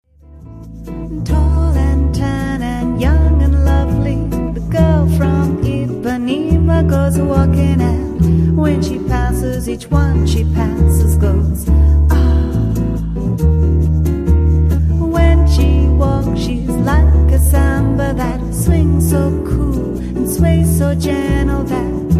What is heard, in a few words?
trio with flute vocals